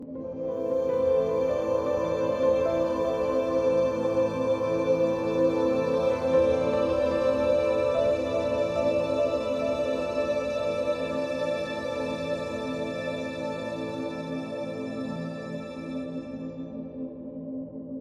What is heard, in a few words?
Music Layer